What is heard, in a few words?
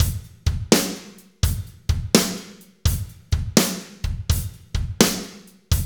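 slow shuffle, Roland drum beats; Northstar - Drumscapes Roland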